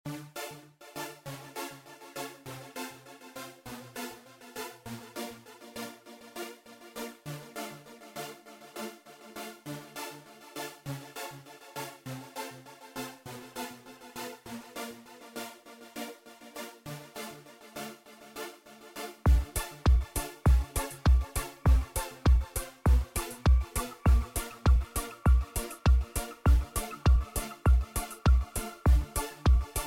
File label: music dance energetic flow